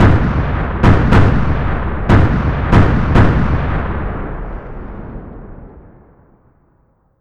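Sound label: cannon sequence heavy filtered; Explosions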